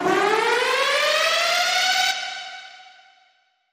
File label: Attack of The Robots